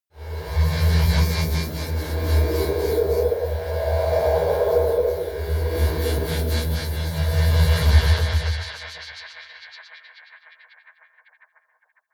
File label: alien horror, science fiction; atmosphaeren umwelt